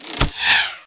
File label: bow miss; wesnoth